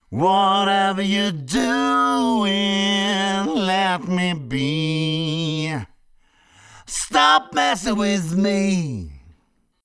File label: Singing Voice; speed 0.83; tsmtoolbox